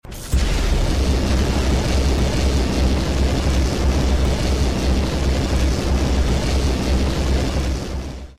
toilet lasers

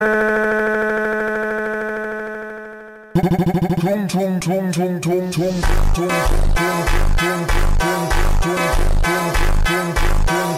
funk funk

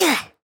death sound effect; roblox

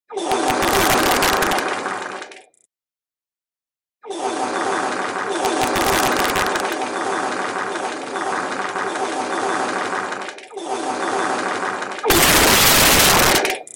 massive wet fart meme

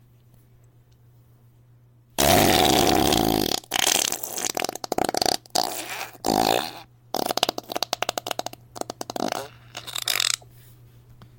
wetest fart ever

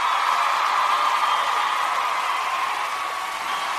crowd cheer and applause

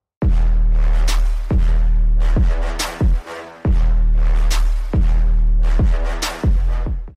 bass boost drop

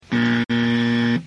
wrong buzzer

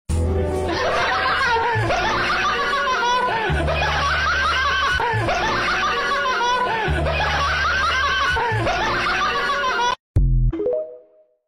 clown laughing

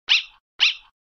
dog bark but little bit louder; undertale

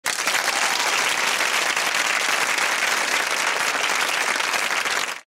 tv applause

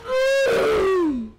death loud slow; roblox

